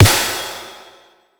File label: snare doosh